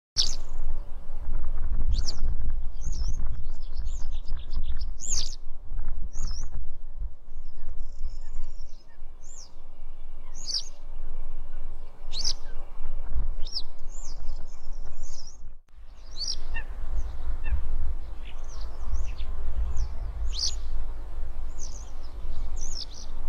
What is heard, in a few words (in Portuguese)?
Canto da alveola citrina